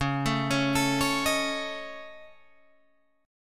C sharp Suspended 2nd Sharp 5th C sharp Guitar Drop D